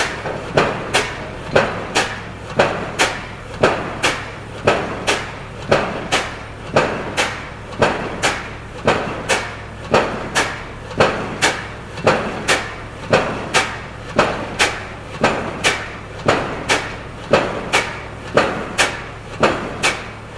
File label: piles loud; art gentrification